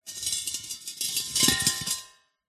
industrial gas canister metal movement scrape on gritty concrete surface